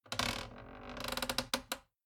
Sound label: wood creak